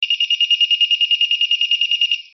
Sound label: metal detector